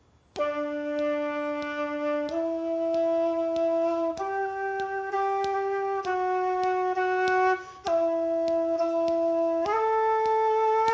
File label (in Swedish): De långa tonerna